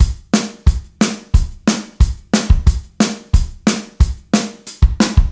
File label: ROCK, Drum Loops, 180